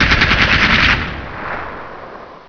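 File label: machine gun burst; ambience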